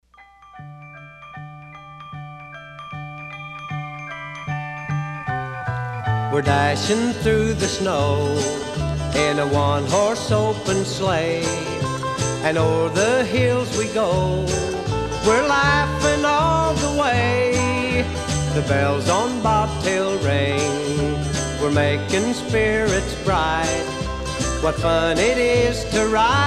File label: Jingle Bells